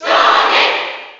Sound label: Sonic Cheer English; SSB4; SSBU